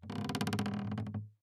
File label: tree; ambient; survival